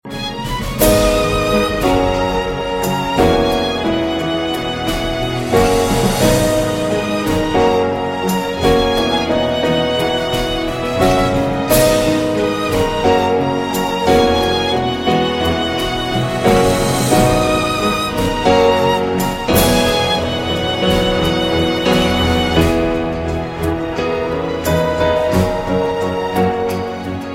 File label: Upwind Instrumental